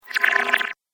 Water - Backward Bubbles; Cartoon Sound Design Sound Effects; Andrew Fleming

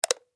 Button Switch

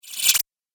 Cartoon Magic Spell

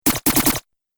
Laser Stun Shots; Laser Gun Sound Effects

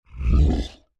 Creature Large Grunt Short; Monsters; Sound Effects:Horror; Big Room Sound

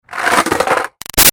Skateboard Curb Crash Scrape; Skateboarding; Sports; Big Room Sound

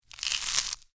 Bite Chew Chomp; BlastWave

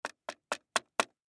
Hockey Helmet Knock, Hockey Helmet Knock Multiple; SoundEffects-Blastwave; Sports

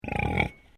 Animal Pig Landrace Grunt

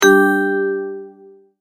Multimedia Tone-Stop; Tone Sound Effects; Sound Design

